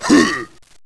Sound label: hoo; birdie